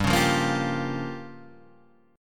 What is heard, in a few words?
G Minor 7th Flat 5th